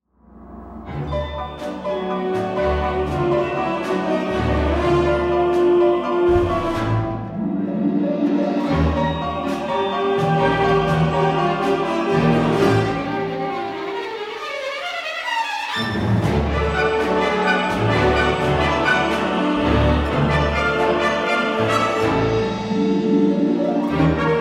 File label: Tango